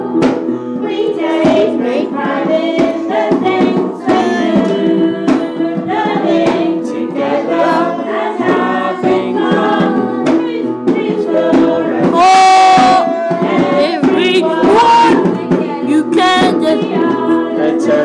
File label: blue class sing the new turney school song